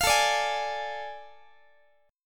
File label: A Major 7th Suspended 4th Sharp 5th, Guitar; AM7sus4#5; strummed; x,x,20,19,18,19